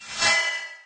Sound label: whoosh fast